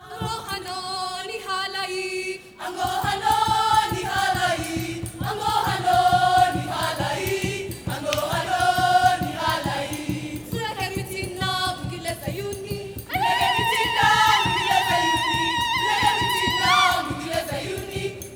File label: AHO D5; Spectrasonics; Heart of Africa 2